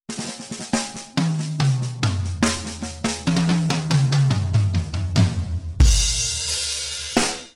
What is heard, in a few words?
drum solo